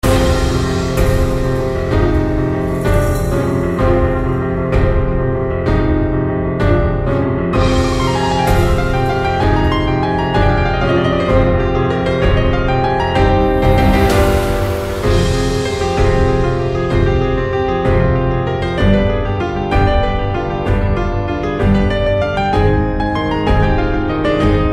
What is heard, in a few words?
Raid; mist train girls android ios mobile gamerip 2020